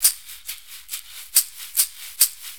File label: 6-8 Caxixi; Drum Tools